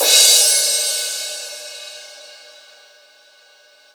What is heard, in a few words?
Crash, Drums Cymbal; Best Service; House Definition VOL-1; Cymbal Stuff